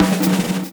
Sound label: DRMROLL; E-Lab; X Static Goldmine 2; akai